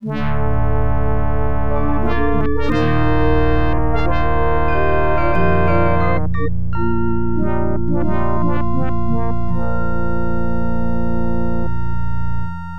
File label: Drop Stone Head On Elevator